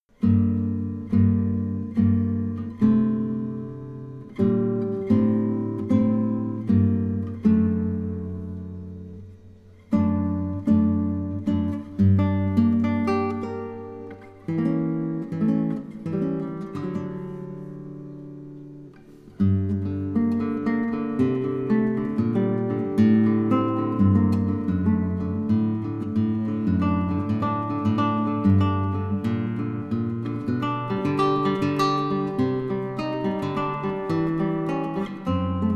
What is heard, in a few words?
La mariposa, guitar; Giuliani M